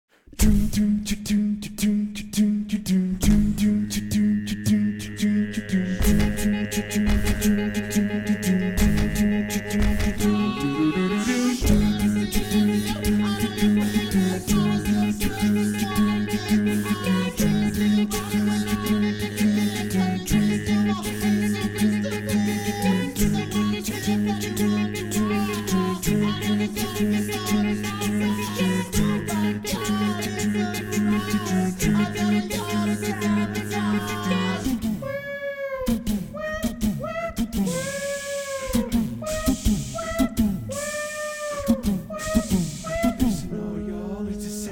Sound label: Baritone Left